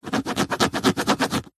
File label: sport rugby ball scrape scratch surface with finger as if removing mark; zapsplat